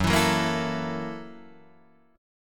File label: F sharp 7th Sharp 9th, Guitar, Open D